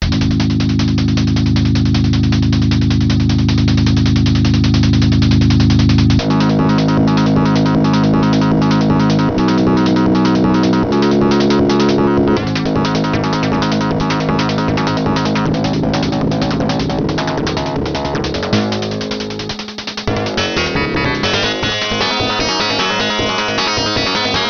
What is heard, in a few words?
vortex, fm; Aureal Vortex (AU8820B2); Примеры звучания FM-синтеза разных звуковых карт под MS-DOS